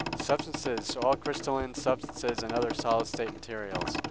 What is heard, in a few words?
noisy